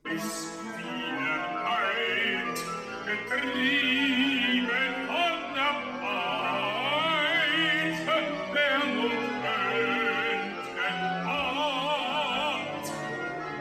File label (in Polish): tenorzy; cardillac